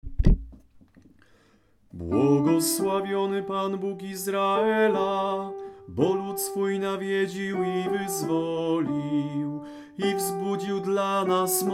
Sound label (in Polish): Benedictus alt